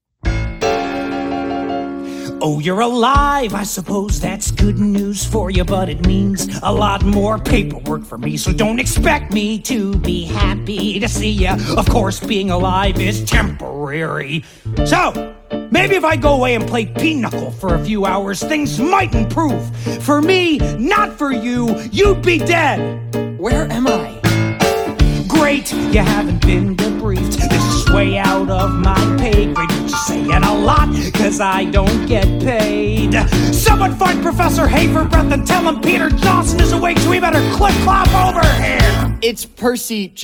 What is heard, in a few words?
with vocals